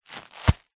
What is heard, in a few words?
luggage leather handbag hit thud; foley